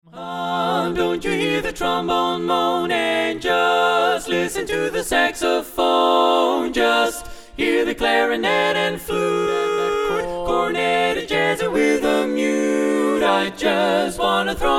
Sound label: female